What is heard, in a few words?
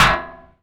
solid metal impact, Bullet Impact; Dystopia; physics